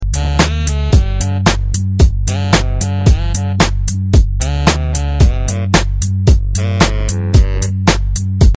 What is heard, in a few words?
Instrumental Loop